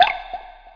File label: ambience underground